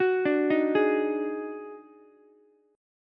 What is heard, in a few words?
Eb Minor Major 7th Double Flat 5th, Ukulele; JGuitar